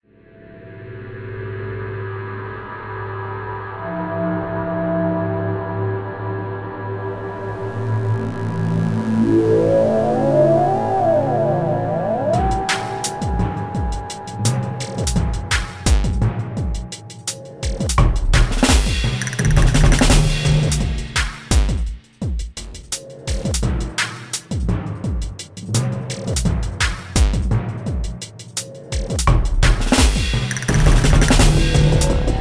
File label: Cave loop